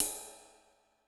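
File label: Ride Dark, Cymbal; Yamaha DTXpress-II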